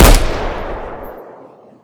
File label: usp unsil; garrysmod